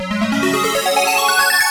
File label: syn voc, keyboard, 140bpm; hous-tec